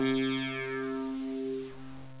mirage clav; OctaMED SoundStudio V1

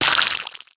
spoo, beetle; Heretic II

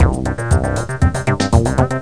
Fast Drum; Cloudz 1.0.3

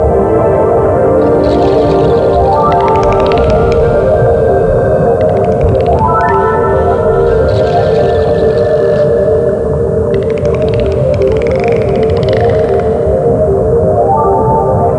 Dolphins; Coral Reef 95 Screensaver

